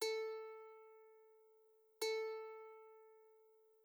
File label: ukulele